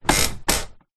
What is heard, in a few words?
household bed person sits on wooden frame creak